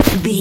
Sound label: footstep grass land